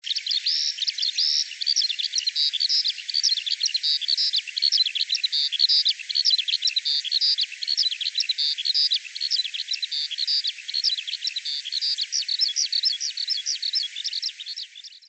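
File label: hibari, skylark; ヒバリ; 河北潟などで聞く; 農地と草地が広がる河北潟干拓地の春は鳥達で賑やかです。; 農地と草地が広がる河北潟干拓地の春は鳥達で賑やかです。キジ、カッコウのバックコーラスを担当するのはヒバリです。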